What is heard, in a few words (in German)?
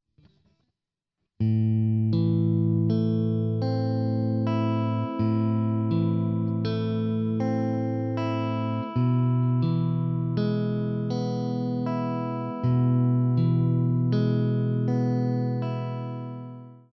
Zupfmuster, gitarre